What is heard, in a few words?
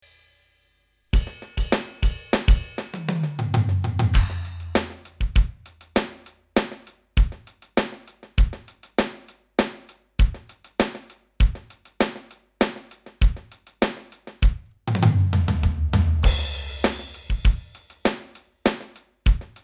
Oddmeter 5 4